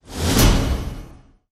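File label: sting, full percussion short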